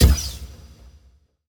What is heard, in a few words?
gambler mouseclick; Magic Stars 5; Wazdan